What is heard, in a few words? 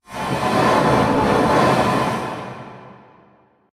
Sword Drag; silent hill homecoming 2008 gamerip pc ps3 x360